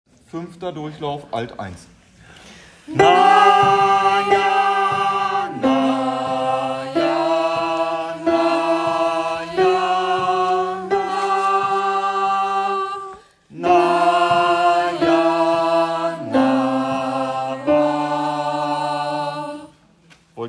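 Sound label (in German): Alt 1 Takt, gospel choir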